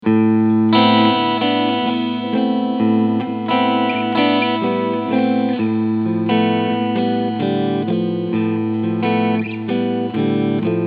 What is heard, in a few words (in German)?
PRS Custom 24 10 Top Alle Cleansounds Position 1 5